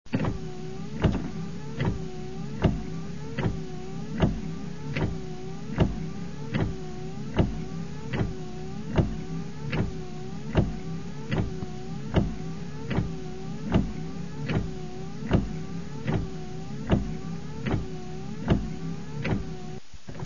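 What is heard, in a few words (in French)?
auto essuie glace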